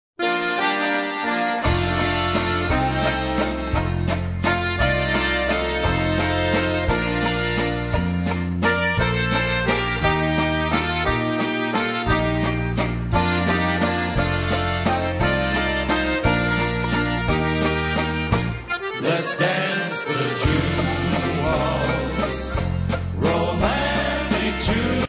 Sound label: waltz